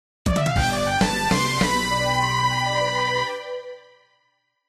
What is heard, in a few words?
Victory